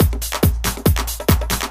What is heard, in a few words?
bongo